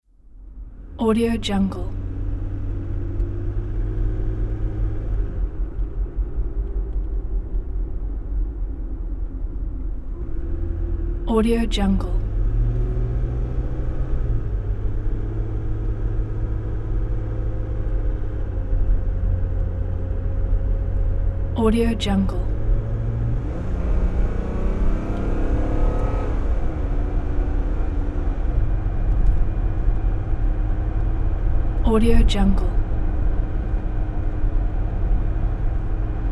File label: inside moving car atmosphere; koreli777